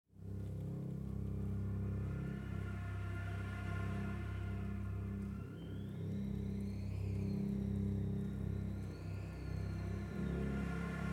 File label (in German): Warmzeit und Mensch Wind, Wind; Above the Ice